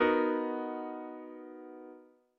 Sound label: B maj7; ackordtabell